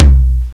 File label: TOM NEW, Fairlight CMI, DRUMS